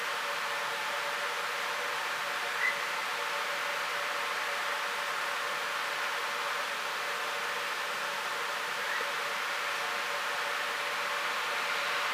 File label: Western swamphen